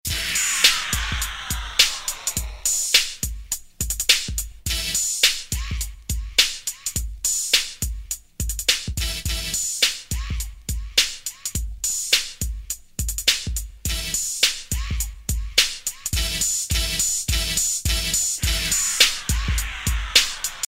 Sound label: Break